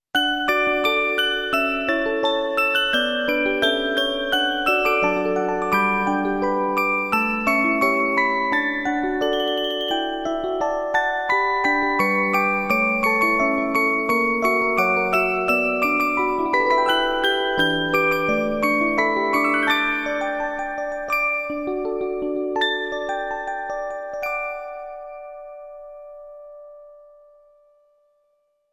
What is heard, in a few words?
bell orgel; Orgel Collection